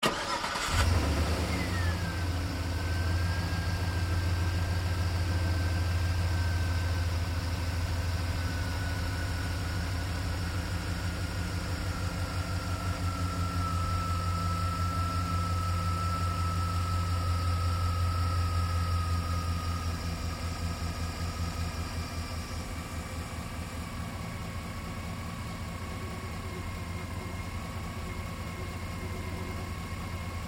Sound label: Truck GMC Savana Engine Start Idle Off; Big Room Sound